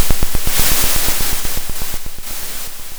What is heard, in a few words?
pop, Sound of a drum roll